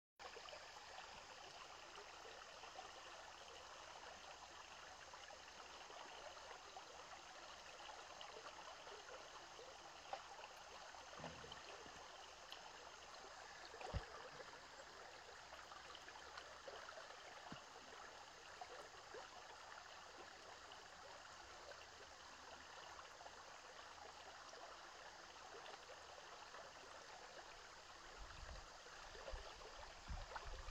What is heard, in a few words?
stream Sounds